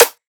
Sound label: Snare Trap; Trap and Dubstep